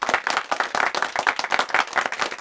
Small Crowd Clap; David Beckham Soccer; XBOX

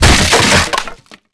crate

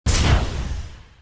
door stone start; movers

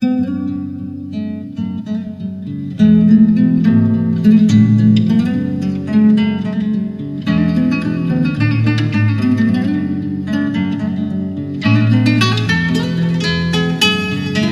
Nice Guitar, Flamenco Fusion; Playboy The Mansion

